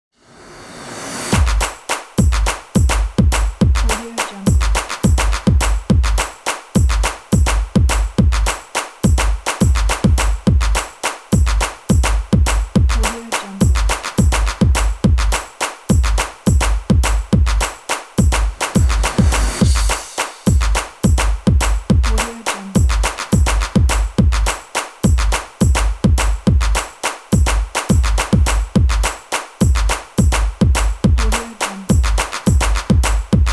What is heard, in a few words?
energy claps